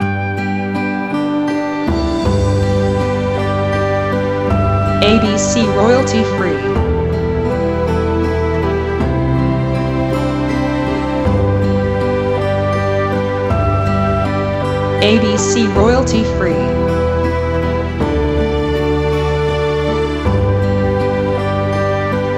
Celtic Irish March

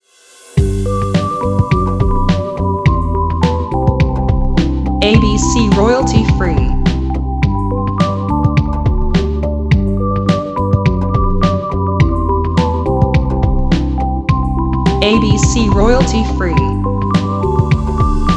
Commercial Background Shorter no Guitar

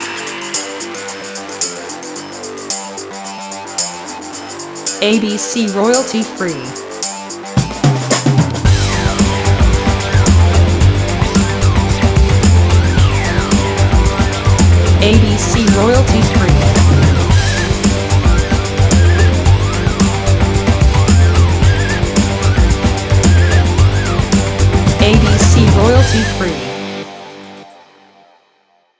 Crime Action Electro Funk

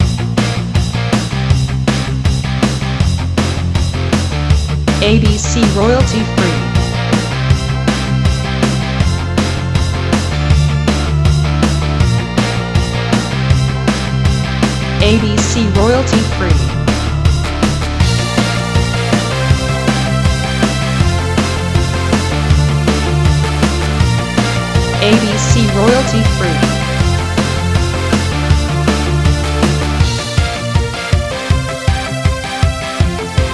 Pop Rock

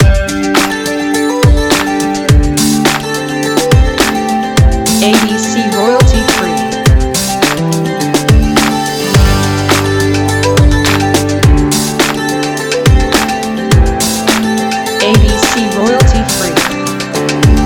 Acoustic pop

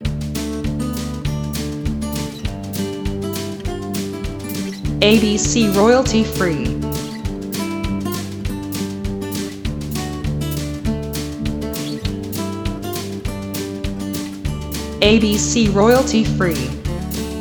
Southern Guitar Picking

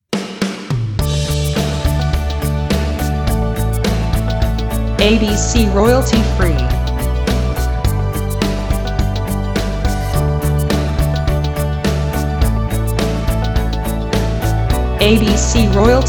strong corporate

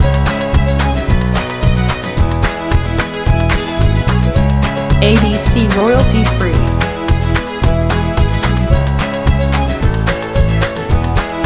Celtic Folk loop

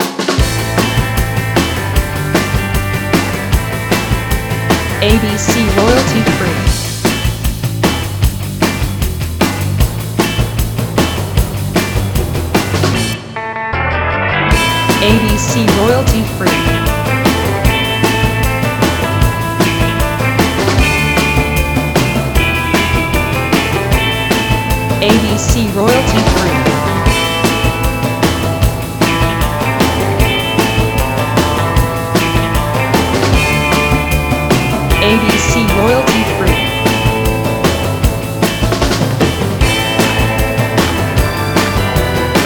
Surf Indie

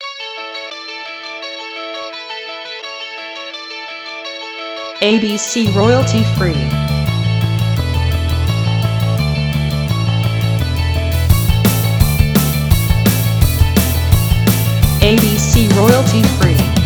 Punk Pop

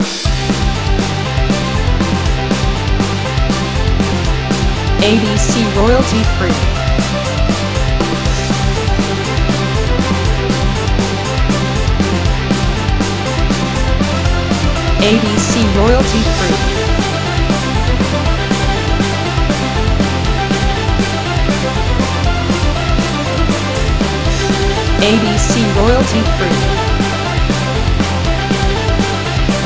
Irish Rock

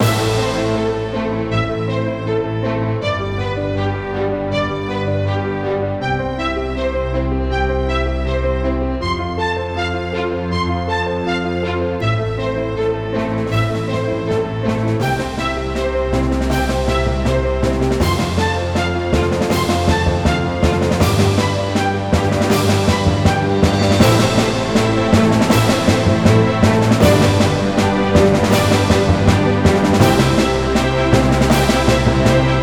Triumphant Corporate

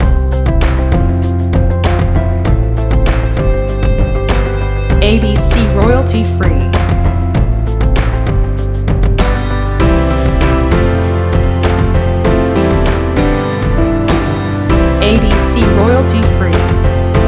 Inspire Corporate Business

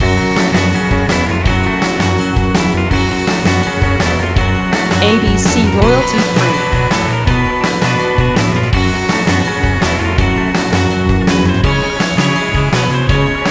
Surf Indie loop

